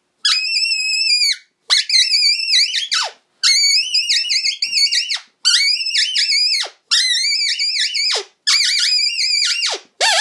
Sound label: Mini Meanie, fox calls